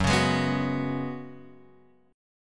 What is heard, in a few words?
F sharp Suspended 2nd Suspended 4th, Guitar, Open F; strummed; x,1,1,3,2,1; down